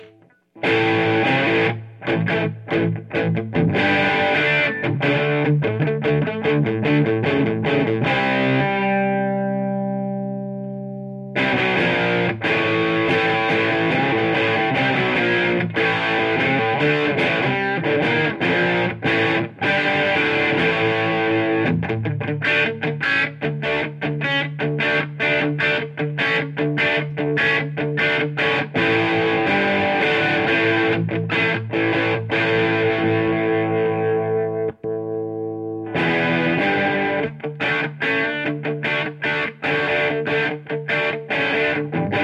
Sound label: Extra Gain Noodling, Distortion Experiment